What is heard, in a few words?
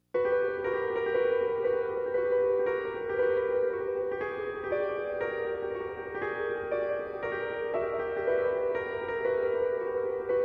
Death Theme Piano